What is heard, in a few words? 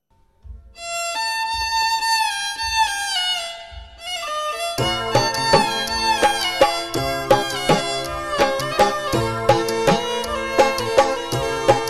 Awesome Tabla Violin